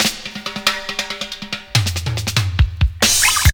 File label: Tearin Roll, Drum Rolls; Zero-G Jungle Warfare 1, 2 & 3; 90 s Sample CDs